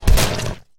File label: hog hit; Original TK Sounds